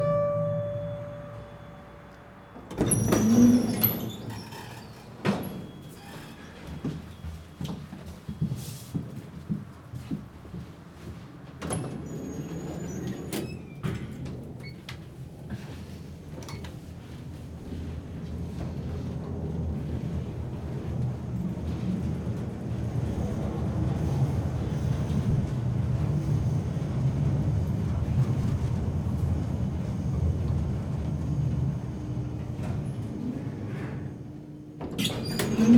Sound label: Lift Ascenseur